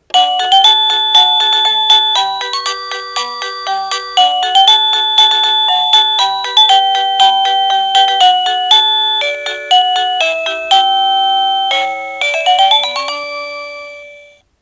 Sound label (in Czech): melodie